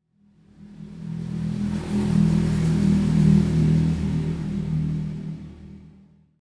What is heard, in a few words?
Motorbike pass by medium speed; vehicle